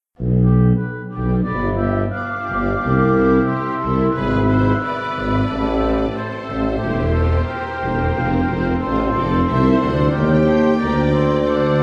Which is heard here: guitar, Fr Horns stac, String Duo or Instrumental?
Instrumental